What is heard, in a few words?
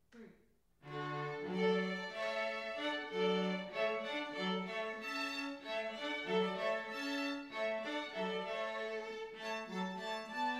Water Music Suite in D Alla Hornpipe, string quartet; wedding music